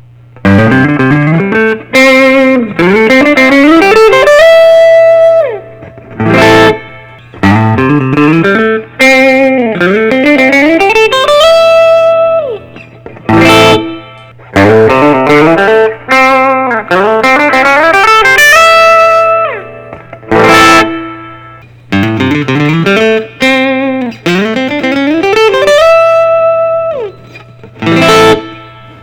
prs hollowbody ii ten to vintage burst

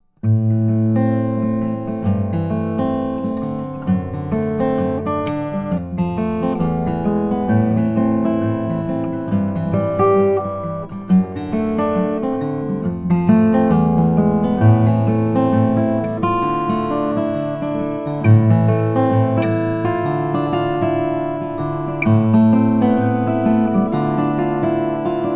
branzell tone top brazilian rosewood branzell

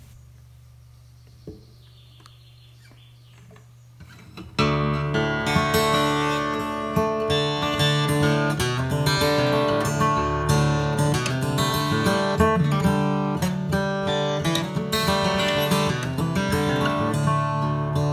gibson j 100 sunburst brown

